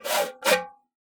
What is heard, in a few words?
oxaca, percussion; ILIO